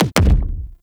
Weapon Punch Double

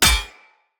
Hammer Impact Parry; Weapon Impacts; Tuvalkane Hammer; Combat

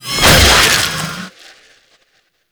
Undead Owl Attack; Warframe; Dante

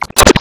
Short Sword Impact Flesh; Weapon Impacts; Swords; Dendrit Short Sword; Combat

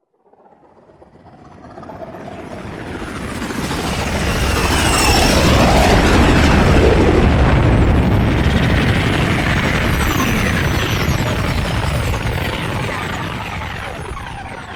Blue Sky Liset Relay Docking, vehicles; Warframe; Liset Flight

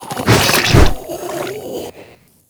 Strike Flesh; Warframe; Proto Infested; Ancient; Abilities

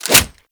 Grenade Launcher Reload; Grineer; Weapons

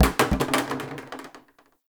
Plastic Hit; Warframe; Ambience; Nineteen Ninety Nine

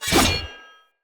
Dagger Heavy Whoosh; Weapons; Dendrit

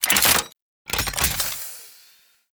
Mech Alt Fire Return, Rifle; Thanotech; Trumna Prime

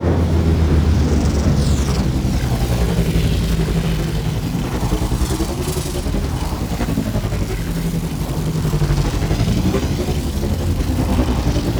Ship Hyper Speed Tunnel

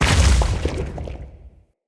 Wrath Head Spike Burst; Bromius; Abilities

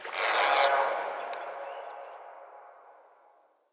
Swamp Pelican; Ambience; Midrath Island

